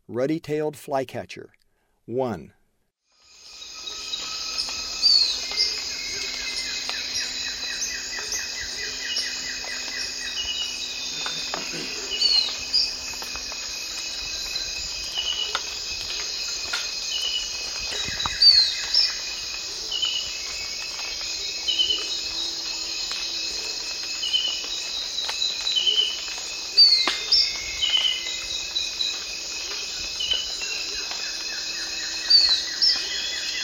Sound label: Ruddy-tailed Flycatcher; S. America; Eastern Lowlands